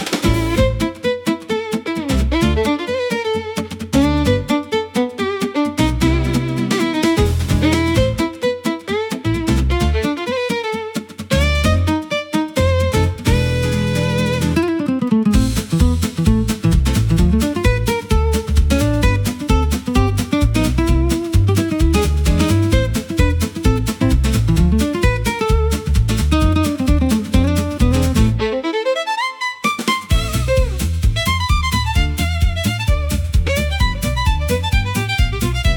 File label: jazz welcome